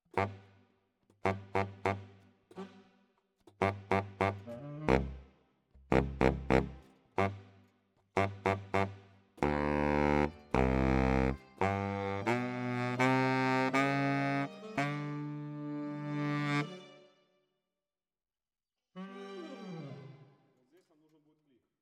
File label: Bari, RNR for piano